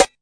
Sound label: classic hh Snr; STYLE KITS (Hip Hop, Etc); Classic Hip Hop Mega Kit